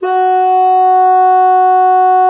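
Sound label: bassoon forte F#3; Xsample VOL-6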